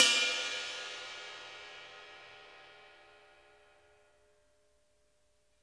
bell, Drum Kits; Sonic Reality

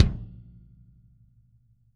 KICK, Drum Kits

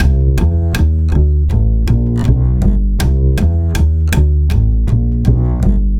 Acoustic Bass

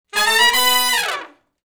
Fast Climb Up (B) unison, Horncraft for R&B; Crimson Blue and Fabulous Horncraft 4 RnB; Sections

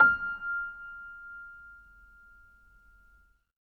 Acoustic Piano, piano stereo; InVision Interactive; Miroslav Vitous

